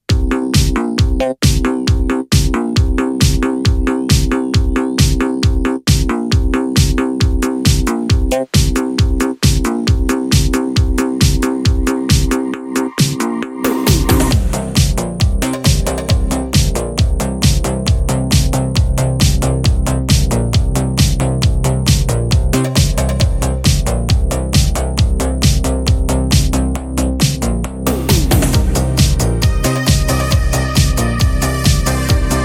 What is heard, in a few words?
Synthwave Retrowave